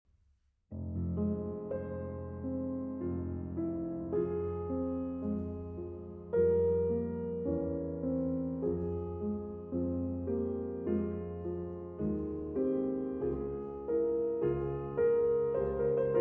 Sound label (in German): Choralvorspiel; 'Ich Ruf' Zu Dir, Herr Jesu Christ'; BWV 543